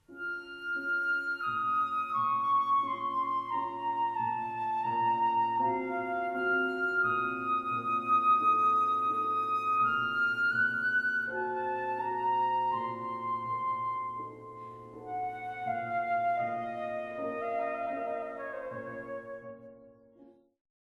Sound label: Cantilena, Flute Sonata; Poulenc